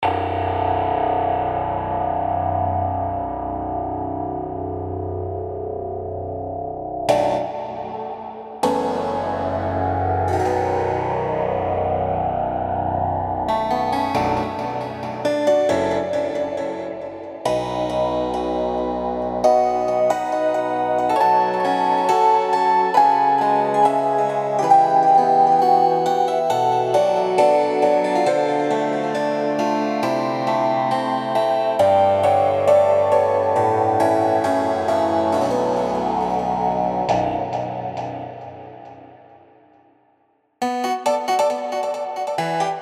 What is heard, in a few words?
Spook Trance